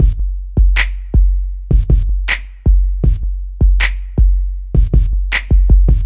079 bpm urban country snap, drum loops and breaks

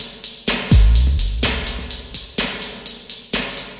126 bpm ohh and snare kick blob

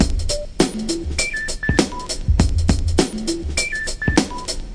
101 bpm bleep hop, drum loops and breaks

